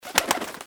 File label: bird Fly